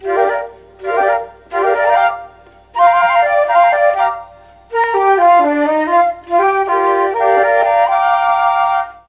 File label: F3 CHA FLT, mellotron